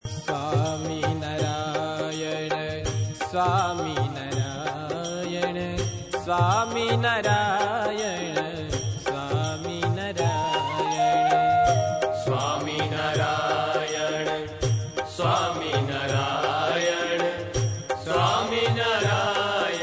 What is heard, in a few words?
Dhun; Swaminarayan